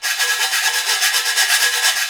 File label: RECO, RecoReco; Club-50 - Foundations Roland; ExotcMetal